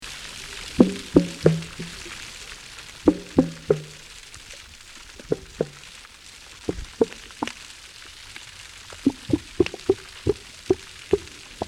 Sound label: Gray, Voices in the sea